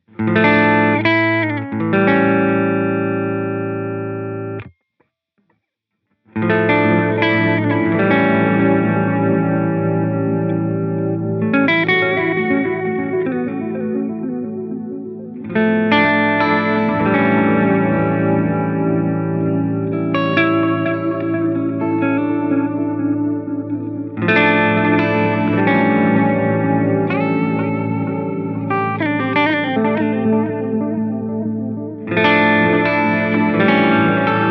Digital Pristine, echosystem

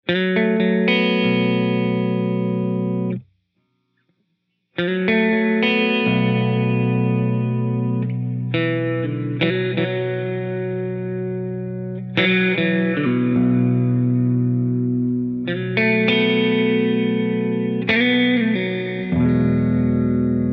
Hall Concert Long Baritone, reverb

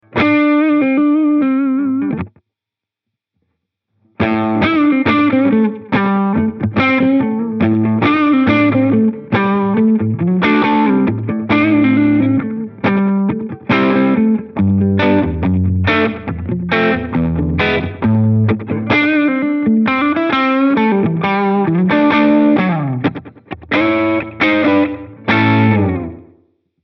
Tape Echoplex

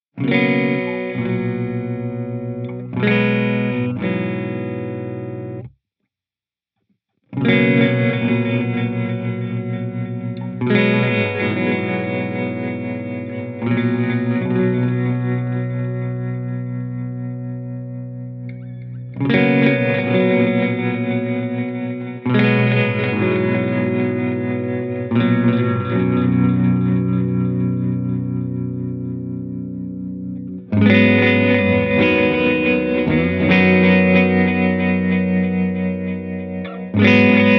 Trem Delay

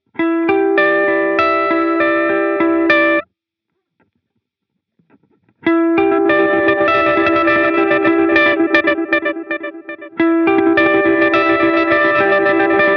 Stutter Chop

